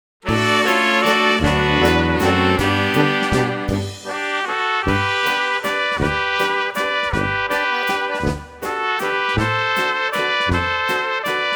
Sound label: Waltz